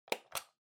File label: Calculator Battery Cover Open